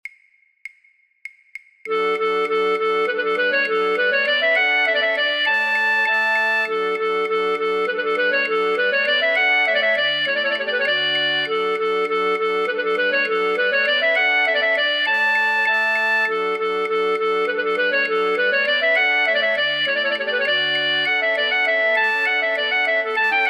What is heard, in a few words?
flute choir alle partijen fluit; voor-oefentracks; Contredanse; Rameau; Platee